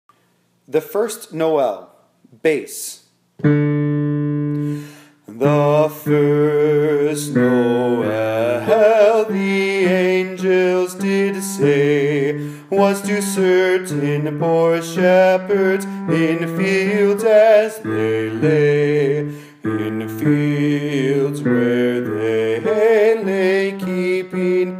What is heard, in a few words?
bass